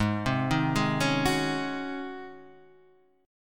C sharp Major 7th Suspended 2nd Suspended 4th G sharp